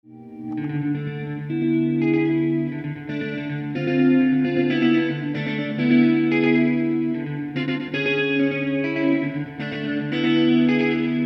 delay 120ms; audio examples from the book

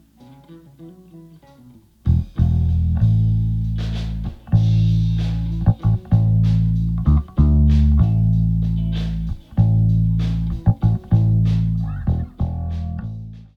mic leakage, Leakage into a mic channel; Mixing for God audio examples

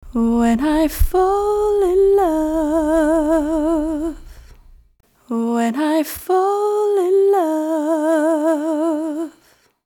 vocal pop bad fixed

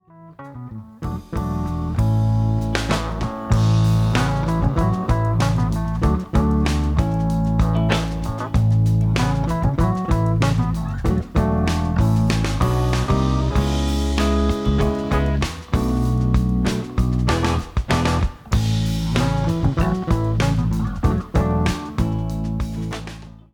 bass high, Bass is too high; Mixing for God audio examples

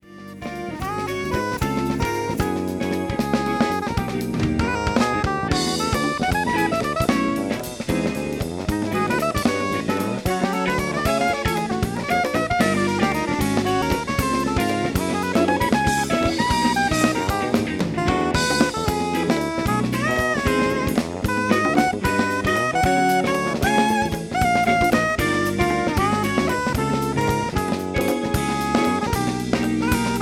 refined mix levels eq; audio examples from the book

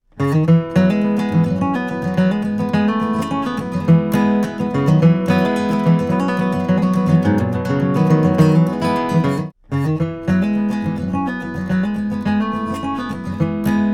phase 3 to 1; audio examples from the book

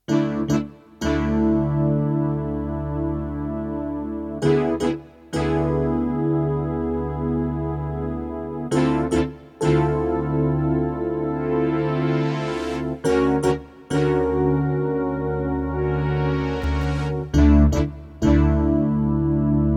tone keys eq

